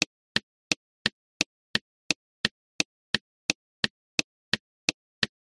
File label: vehicles car indicator; zapsplat